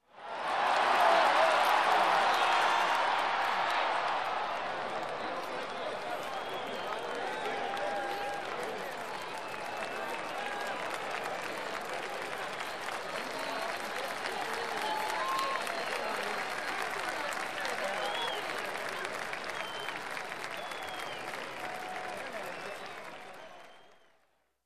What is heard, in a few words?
Audience Cheering and Conversing